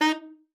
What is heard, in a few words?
D#4, ALTOSAX; Xsample VOL-2